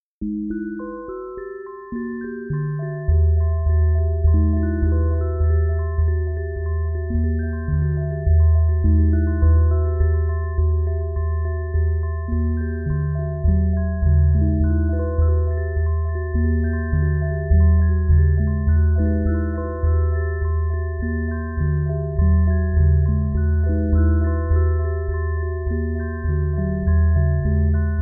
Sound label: Music Box With Sub